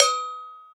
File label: Cowbell